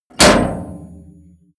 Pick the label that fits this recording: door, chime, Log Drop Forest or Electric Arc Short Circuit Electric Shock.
door